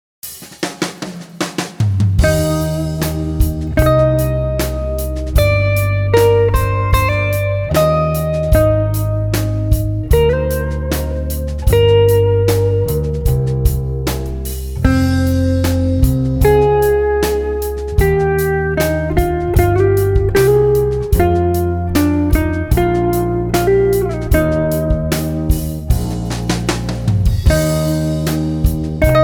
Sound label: Dinner Jazz